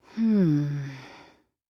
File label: hmmm